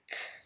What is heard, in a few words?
hiss